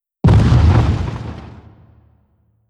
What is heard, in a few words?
airplane bomb